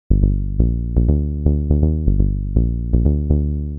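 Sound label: 122 BPM Bass Synth A major G minor Tonic D; Pro Bass Synth Studio Kit; MMB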